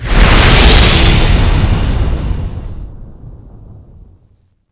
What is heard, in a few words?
blindbomb explode